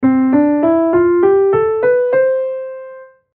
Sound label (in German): C-dur up